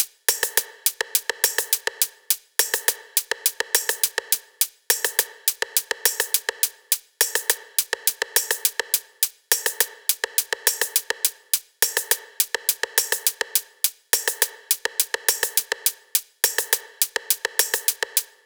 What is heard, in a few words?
Drum Loop 104bpm; Zenhiser